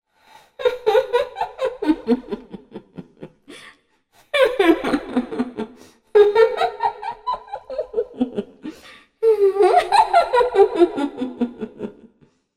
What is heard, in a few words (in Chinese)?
模仿, 巫婆阴森笑声